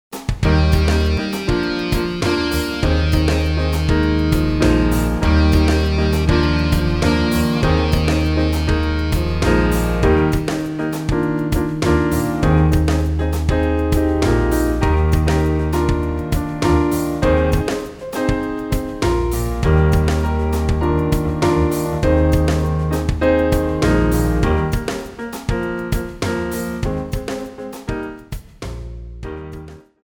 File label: Accompaniment